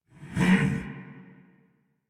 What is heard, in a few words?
Attack Effort; Warframe; Dax Heavy; en